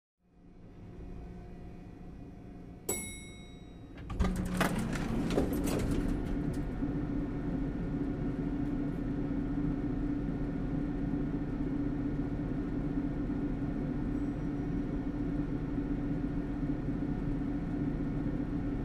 zvuk otrkrytiya i zakrytiya dverey lifta

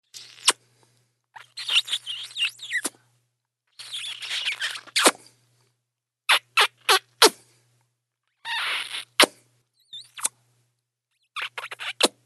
pocelui

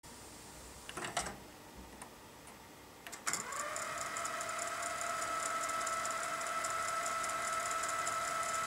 magnitofon peremotka kassety

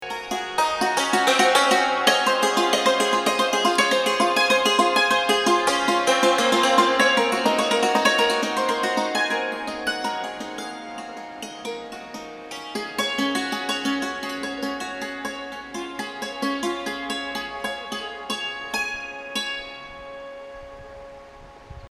cymbaly

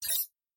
effekt udalenia bukvy

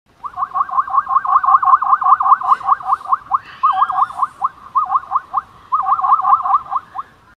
okapi

